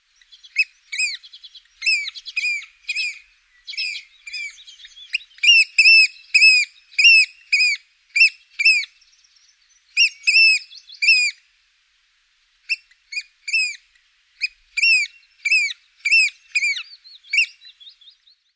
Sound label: oystercatcher